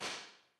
The Beautiful Room, Sennheiser e904, impulse responses; Henry Olonga